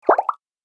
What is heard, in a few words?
Light bubble pop alert